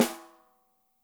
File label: DRY, snares; Spectrasonics - Burning Grooves CD2